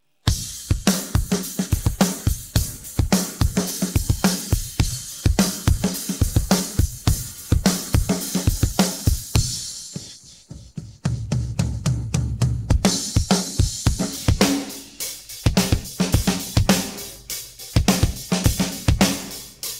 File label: drums